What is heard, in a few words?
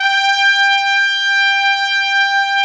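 TRUMPET, Brass; AKAI S6000 CD-ROM - Ultimate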